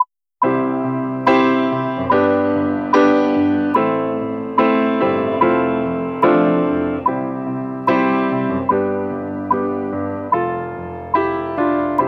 segmented beats

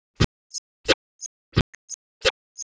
drums alg; dereverb

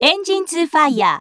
Tone ENG2 FIRE chorus

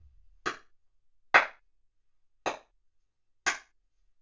clapping reverb; dereverb

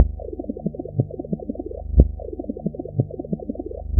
risset beats

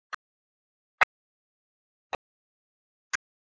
clapping alg; dereverb

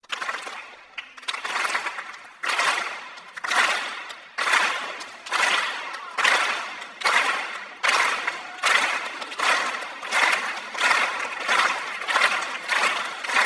applause

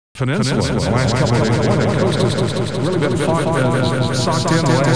Echohall

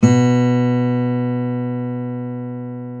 ks pluck B3

